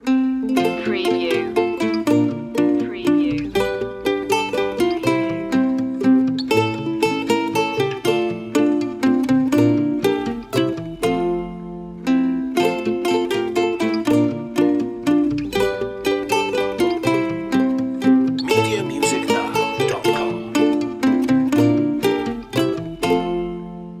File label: we wish you a merry christmas ukulele loop